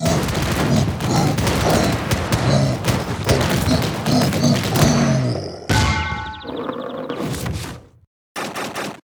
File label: wukong death